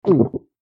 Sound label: glurp